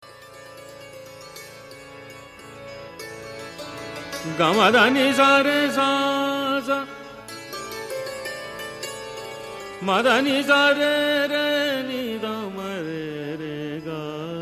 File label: Classical Tone